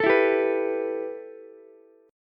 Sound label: A 7th Suspended 2nd A, Ukulele; A7sus2 strummed